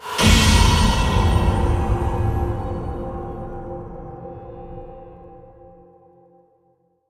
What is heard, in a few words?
Act Completed; Dungeon Hunter 2